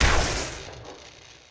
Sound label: shock weapon fire; shockwav weapon; nova sound